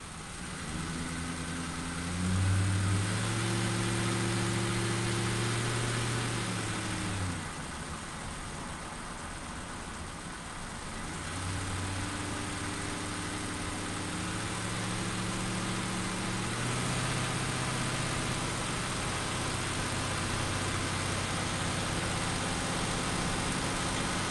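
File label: ford crown victoria onboard steady rpms drive engine left; dpa4061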